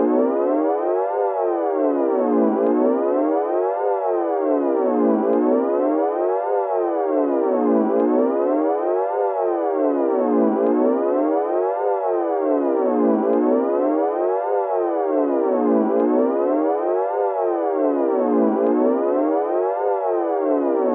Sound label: bgm fast